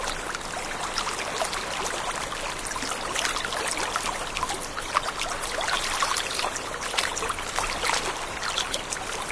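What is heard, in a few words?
water lap loop stereo, swamps; Left 4 Dead 2; Source Engine; ambient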